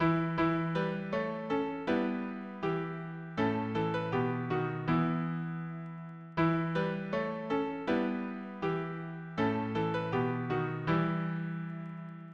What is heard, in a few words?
harmony piano